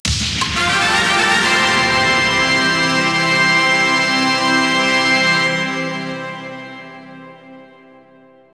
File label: Str G#